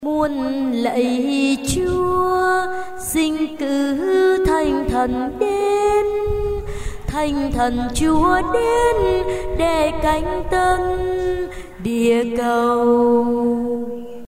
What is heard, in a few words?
basso